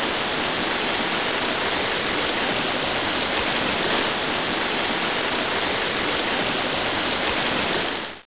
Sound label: waterfall